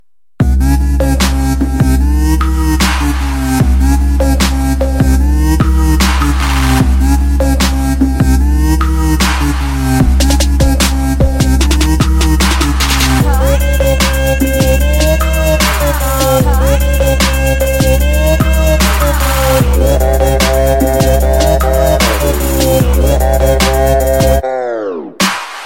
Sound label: instrumental